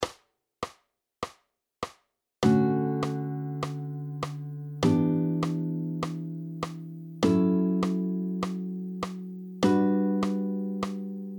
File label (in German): Jazz Stufenakkorde 4stimmig Grundton D Saite; gitarre